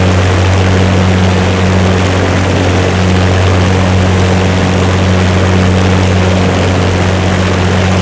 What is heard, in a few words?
Aircrafts Engines Rolls Royce Merlin; DCS